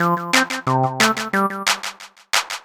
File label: Beat